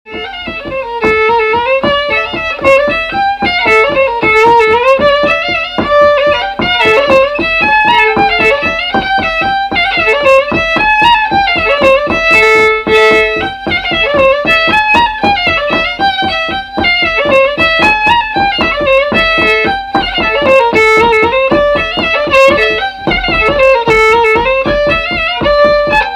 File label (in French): Bourrée coulée